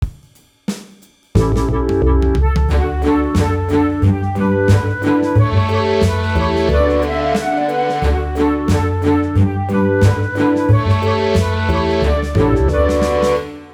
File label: Little Brown Jug cello performance tempo